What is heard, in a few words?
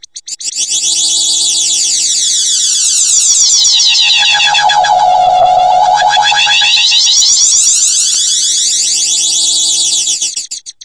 synth swoosh; Computery or Science Fiction